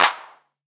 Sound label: Clap